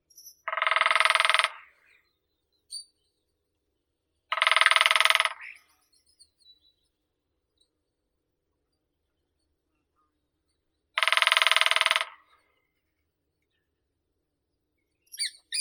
dyatel malii pestrii dendrocopos minor

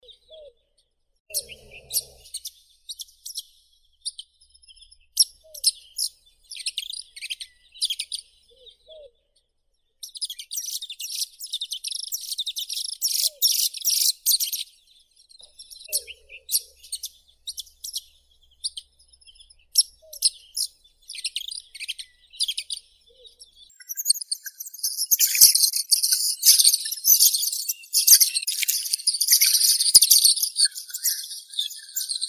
zimorodok malii pegii ceryle rudis; zvuki prirodi

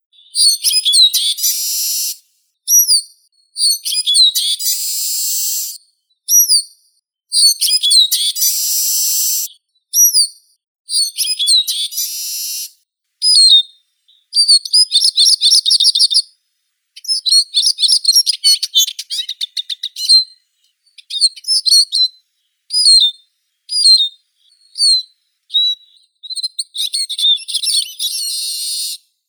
chizh spinus spinus

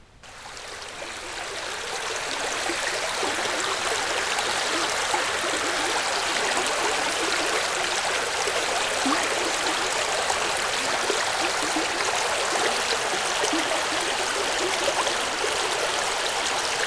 melkoi rechushki pesnya

